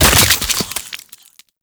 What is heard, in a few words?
Inf Uzi Explosion; Infested; Weapons